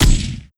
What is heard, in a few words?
chaingun fire